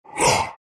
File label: shards pain; terrorblade